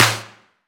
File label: big acoustic snare a sharp key, snare drum tuned to the A# note